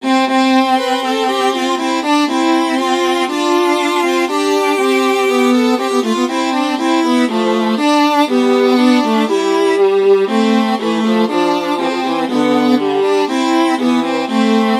march in C for viola duet; purcell henry

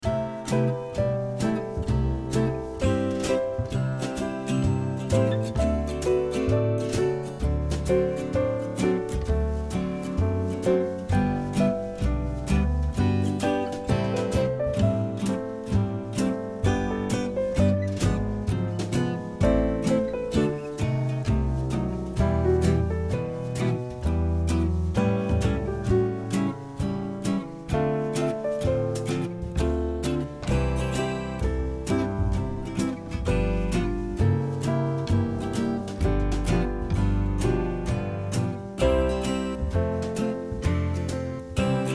jazz

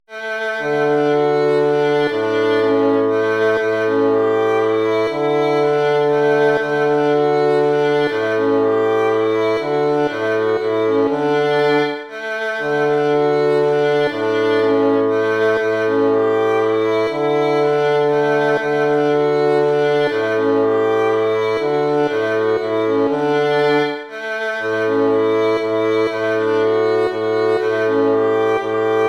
waltz; Carulli Ferdinando

